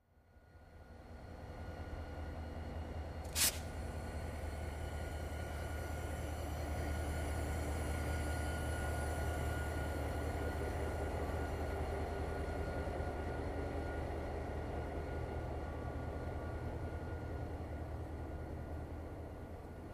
Train Station Amb

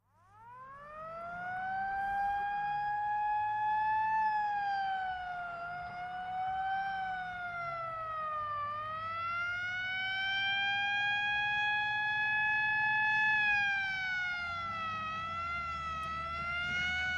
Fire Truck By Slow

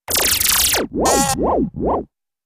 Synthy Beeplike Zap, zaps

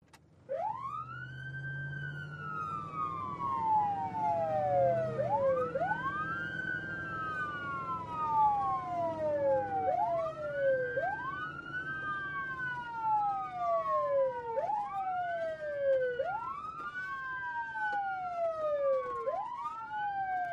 Ambulance Interior, ambulance

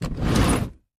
Slide On Floor, trunks

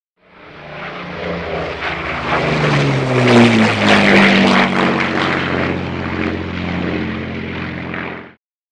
Prop Plane Fly By Mosq, mosquito ext fly-by

